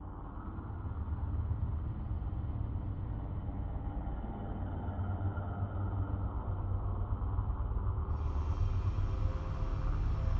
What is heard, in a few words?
Suspense Dark